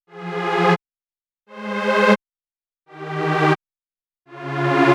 Chords Fmin

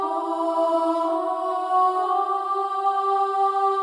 Angel Voice

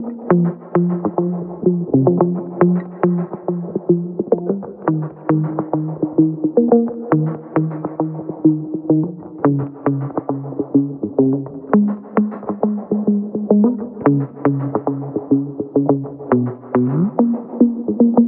guitar electric F min; mesquite

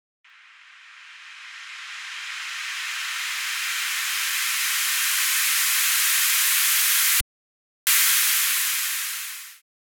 FX 122 F Wet

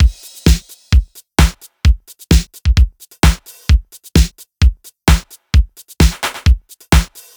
a Drum Loop 130bpm

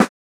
snare

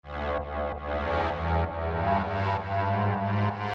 FX Evil Dead

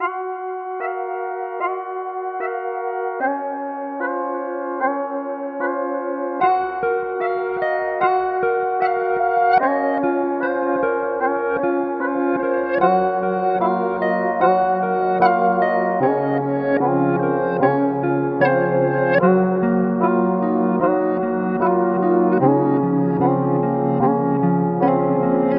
Sad Synths 150 BPM D m

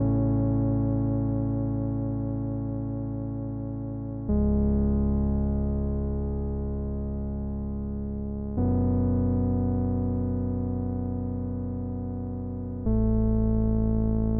synth sub block

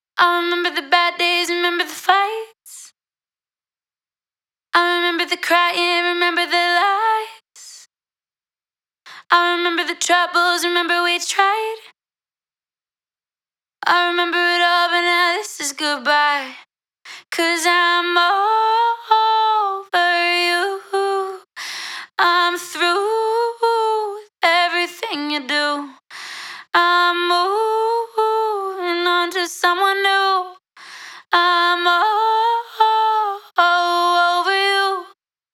Ultra Tropical House Vocals F Major Lead DRY

